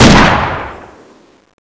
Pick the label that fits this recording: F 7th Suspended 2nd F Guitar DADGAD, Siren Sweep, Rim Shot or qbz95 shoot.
qbz95 shoot